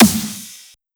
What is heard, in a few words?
soft hit clap; osu